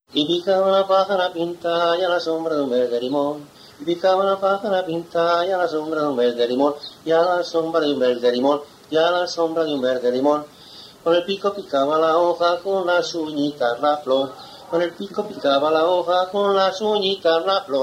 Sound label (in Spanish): Lazo de Villafrades de Campos